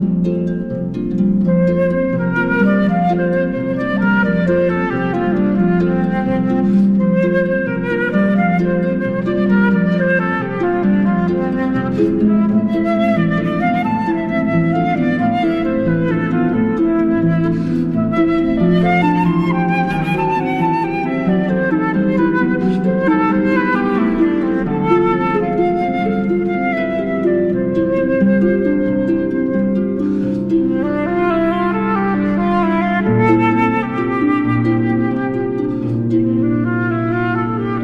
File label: flute and harp, Berceuse; Op. 16